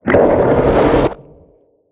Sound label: damage; SPINELINGGIANT